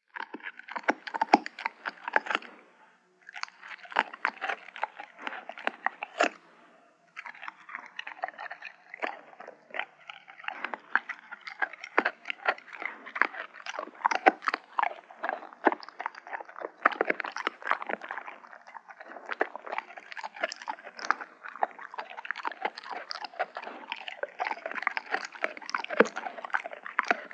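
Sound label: dog eats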